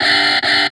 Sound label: GTR, Grunge Hip Hop; USB Soundscan vol.34; AKAI; RNB 101